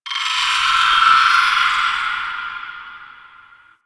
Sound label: High Tech; Warframe; Guild